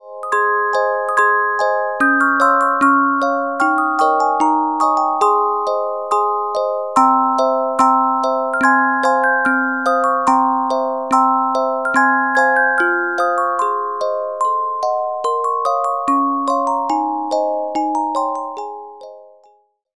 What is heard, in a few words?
Carillon Music Box Melodies